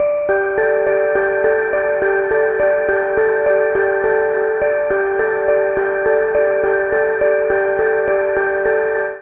Arctic Shadows D Major 104 bpm, Melodic; Tonal; MIDI Clips; Drive and Glow; Ableton; Factory Packs

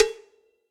Cowbells Room With A Vista, Drum Hits, Bell; Drums; Ableton; Session Drums Studio; MPE Hits

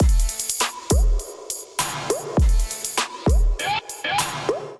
kit groove 100 bpm; Miami Night Kit

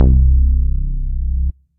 Bass of Velvet, synth bass; Ableton; Factory Packs; Singularities

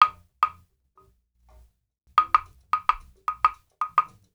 110 African Woodclave; World; African Percussion

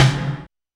snare; Ableton Foundation FreePacks

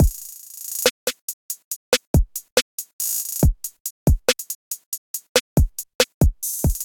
Kit, Trap Drums; Beacon Kit; Trap Drums by Sound Oracle; Ableton; Factory Packs

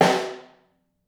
SN2 Room, snare; Ableton Foundation FreePacks; Snare Designer

